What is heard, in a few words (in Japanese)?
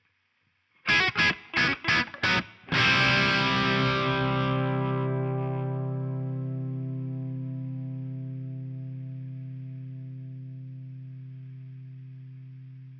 タップ歪